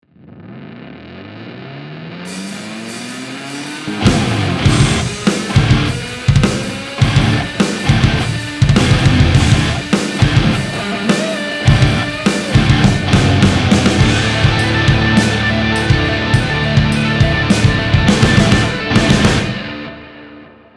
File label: rock heavy